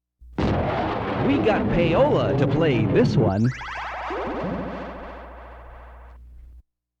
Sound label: thundershot; 1970s